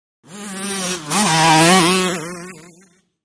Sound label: Motorcycle Motocross; By 250 Cc Honda, Cu Fast By R / L, Shot Cu.